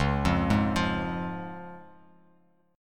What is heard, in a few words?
C sharp Major, Bass Standard; 9,8,6,10; arpeggiated; JGuitar